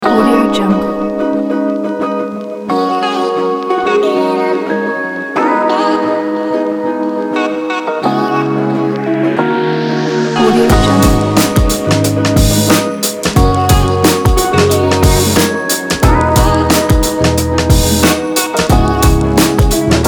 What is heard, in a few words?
melancholic lounge